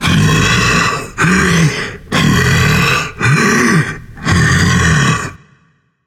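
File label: non-verbal breath; smite; atlas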